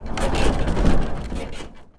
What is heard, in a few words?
plane metall stress